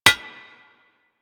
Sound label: Bullet Impact Armour; Warframe; Weapons